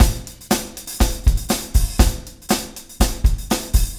Motown, R&B Drums; Legacy; Drummers of Motown

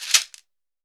cabasa; Brazil

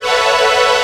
PADLIKE, Hip Hop; E-MU Formula 4000 Series Vol. 1; Hip Hop Nation

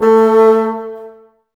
Bassoons, Woodwind; Miroslav Vitous - Woodwind Brass Ensemble

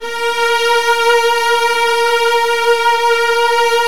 A#2, Virtuoso Strings; ILIO; Kirk Hunter Virtuoso Strings VOL-2

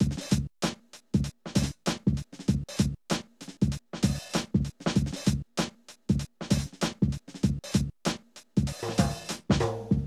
Drum loops, Hip-hop

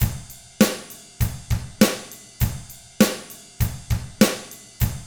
ROCK, Drums; ILIO; Double Platinum Drums 1

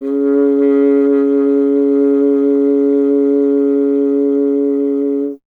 BRS; Miroslav Vitous; Solo Instruments VOL-2; Solo 2 Bank 1

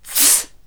NOISE, CLASSIC VOX; Best Service; Hallelujah

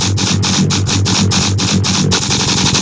Treat Guit, Industrial Nasty

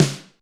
deep snare, Kawai XD-5

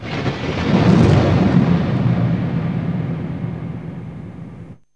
THUNDER; Zero-G Ambient 1; THUNDER+RAIN

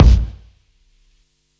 Kick, Psychedelic Drums

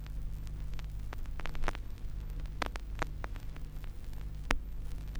Crackle, Vinyl Noise; noise hiss crackle

